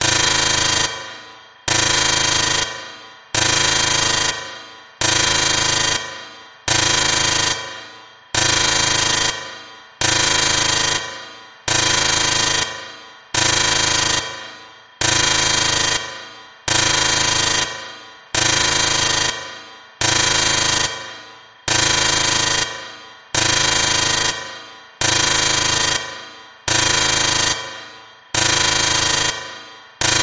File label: alarm siren big serious meltdown buzz reverb slow; science fiction